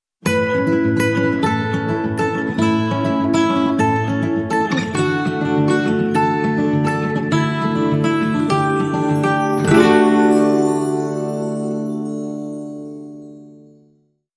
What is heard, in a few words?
Guitar Sting Long